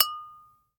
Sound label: GLASS Tap; mono; Universal Sound FX